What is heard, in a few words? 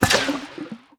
Bird Enter Water; angry birds rio